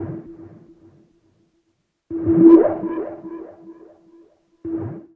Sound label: Water FX; Sonic Foundry (Sony Creative Software) - Stylus Pressure Urban Grooves on Digital Wax; Alien Tribe